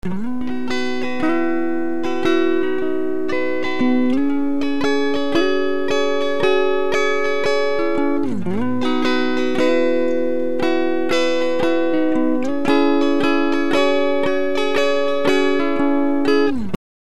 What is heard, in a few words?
Pitch Shift half step, Raised half step; Up half step